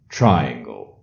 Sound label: Triangle; WoWInterface; WeakAuras